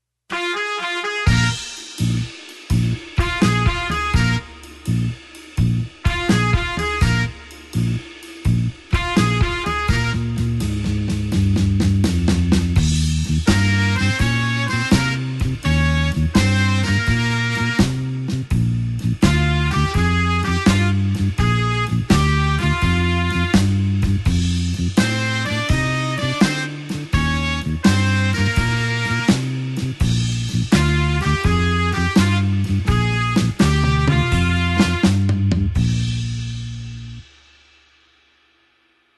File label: blues horns shuffle Bb